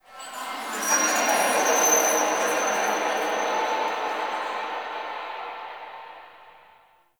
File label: Zariman Memory Echoes, Ambience; Warframe; The New War